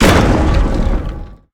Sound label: explosion; smite; geb